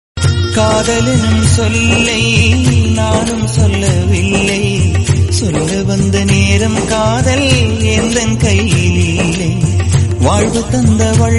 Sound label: Tamil Ringtone